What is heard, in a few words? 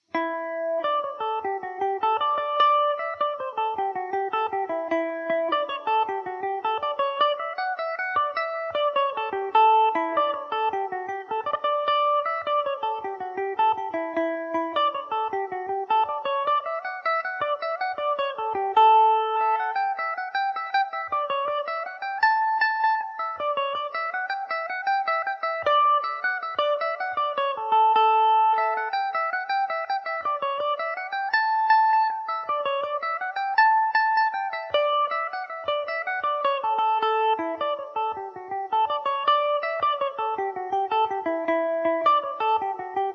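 D major, Irish mandolin; Neary’s (aka By Golly); Revelation Jaguar electric mandolin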